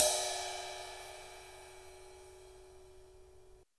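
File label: Jazz kit ride, Drums Acoustic; USB Soundscan vol.10; AKAI; JAZZKIT 2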